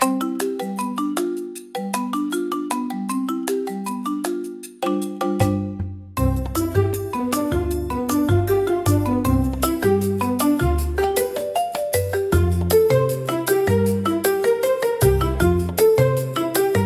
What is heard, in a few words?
Playful Marimba Antics, comical marimba for funny scenes